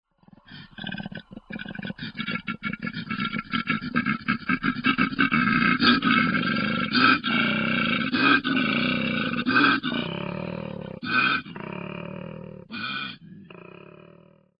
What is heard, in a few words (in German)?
Koala; ZOO; erlebnis zoo